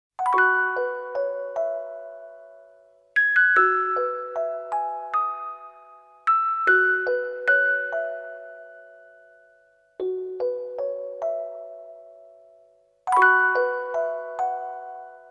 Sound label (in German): Orgel, MusicBox; SailorMoon